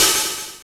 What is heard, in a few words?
Linn LM-1, digital; 90 s Sample CDs; Sound & Vision - Gigapack I CD 1 (Roland)